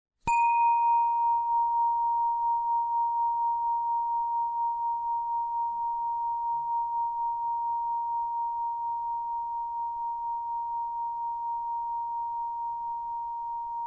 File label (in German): 936 Hz Klangroehre Zirbeldruese Klangschwingung Tonbeispiel; zavesny meditacny zvon 936 hz epifyza